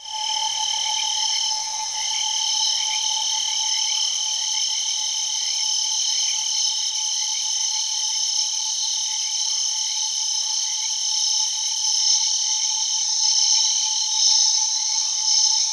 Crickets G#3; Zero-G - Ghost In The Machine

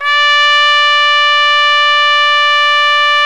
TRUMPET; Best Service Gigapack 1

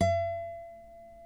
NYLON NO VIB F#5A, Guitars; Spectrasonics; Hans Zimmer Guitars VOL-1

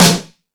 All Snares, E-MU Pro-Cussion

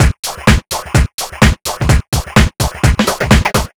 Fill, Drum Loops 127bpm; Zero-G; Soundclash Nu Dance Electro; Acidized Wavs; Ugly Funk

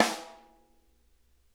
rim mf R, snare; Sonic Reality; Interactive Drum Kits

